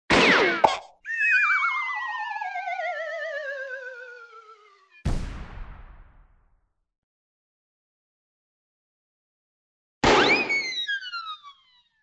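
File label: shot object falls down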